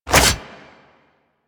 Melee Block Start; Warframe; Wraith

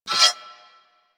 Melee Impact Metal; Warframe; Weapons

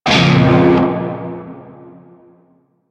Shawzin Hirajoshi Chord E; Music; Warframe; Narmer Shawzin

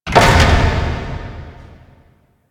Temple Tunnel Door Close B; Warframe; Harrow; Red Veil Temple

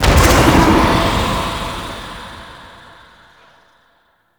Pod Void Pulse Cinematic; Warframe; Liset; Hub; Lower Deck

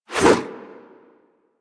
Sentient Brachiolyst Melee Whoosh; Warframe; Sentients

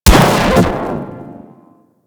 Electro Mag Explode, Weapons; Warframe; Corpus

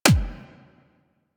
Weapon Punch Pistol

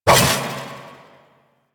Two Handed Nikana Swing; Warframe; Duviri; Duviri Dax; Weapons

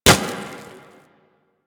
Glass Bullet Impact; Ambience; Props; Warframe; NineteenNinetyNine

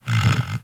Tame Interact Sleep Snore; Enemies; Warframe

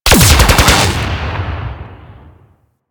Cluster Shot Fire; Enemies; Abilities; Teralyst

